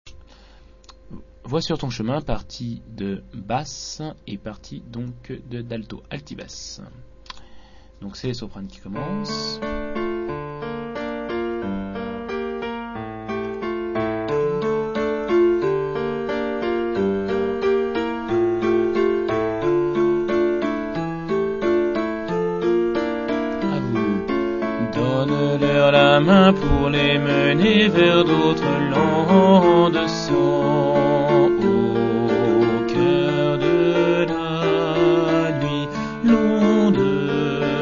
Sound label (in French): altos et basses